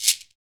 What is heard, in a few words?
SHAKER, Afro Shakers, Shake&Rattle